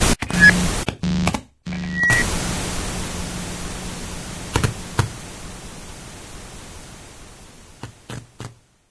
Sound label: teleconference end; video games; ambient; source engine; portal2; outland